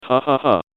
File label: laugh evil; John Madden